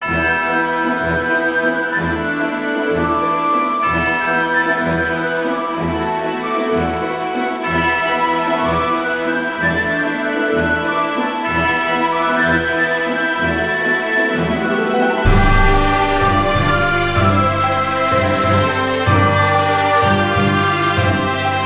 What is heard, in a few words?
bgm dungeon tower; kerukare